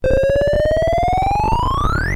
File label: GameOver; Manic Miner